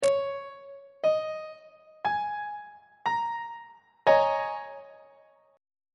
d sharp dominant 7th suspended 4th 3rd inversion on treble clef